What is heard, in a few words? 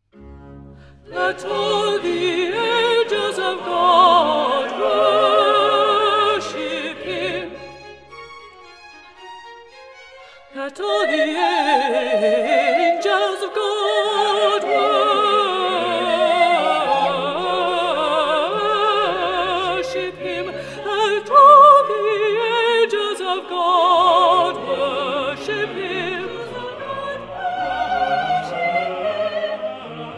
Let all the angels of God contralti; Messiah; handel messiah